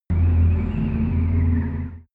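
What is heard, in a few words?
extrinsic wind, energy; energie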